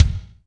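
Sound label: bass drum, Roland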